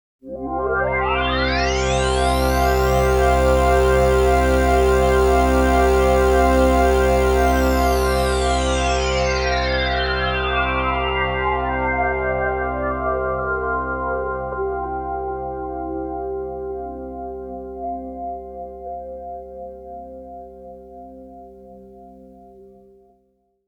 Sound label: Synthesizer Sweep